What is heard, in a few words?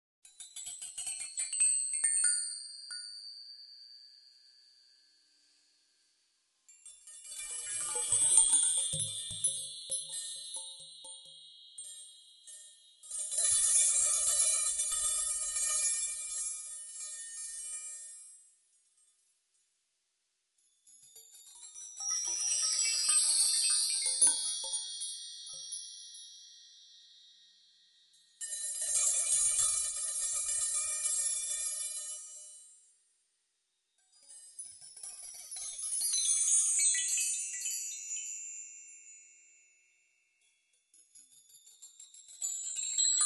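magic chimes, fairies or stars flying by; Перезвон колокольчиков создаёт волшебные звуки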